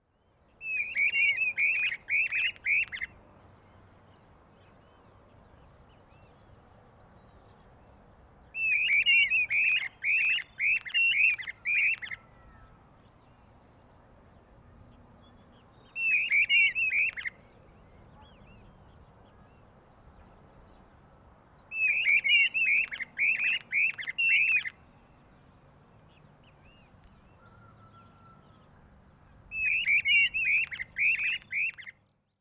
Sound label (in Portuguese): Tesoura do brejo Gubernetes yetapa; Jacauna